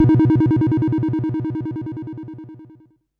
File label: Perc Synth Raw